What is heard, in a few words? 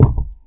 Thud